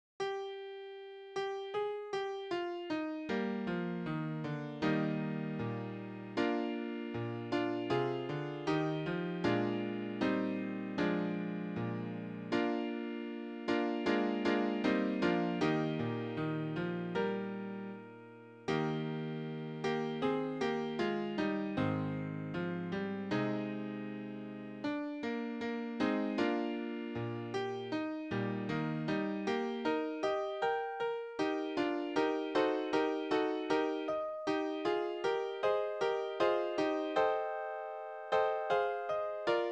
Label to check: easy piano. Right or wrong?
Right